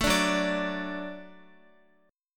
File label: F sharp Minor Sharp 5th, Guitar; F#m#5 strummed; x,x,7,7,6,9; 4E,4A,5D,5G,5C,6F; chord shape